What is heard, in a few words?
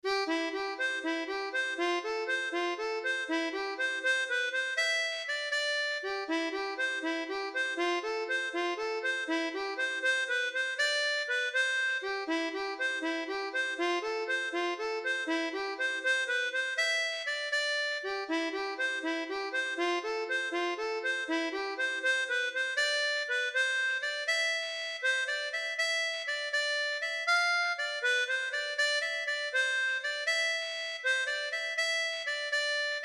Jig; Sally Sloane